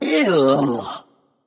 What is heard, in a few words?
"ugh"; smite; janus